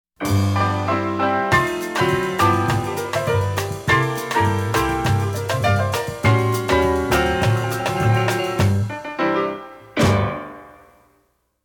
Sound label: Rollin Piano; Pams